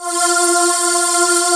AIR VOX F3; AKAI S-Series CD-ROM Sound Library VOL-7

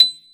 PF, GRAND PIANO; AKAI S6000 CD-ROM - Volume 1

